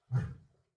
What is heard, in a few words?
lion roar